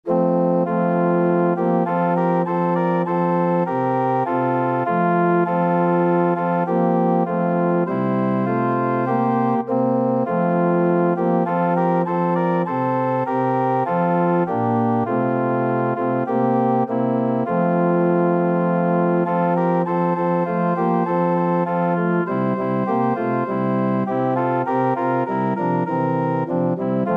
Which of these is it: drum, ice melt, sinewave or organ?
organ